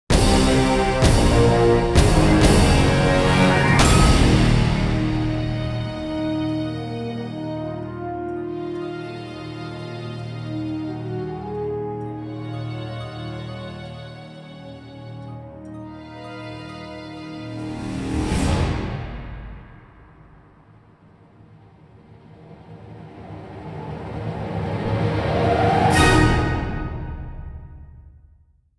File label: Jingle Lost; The Show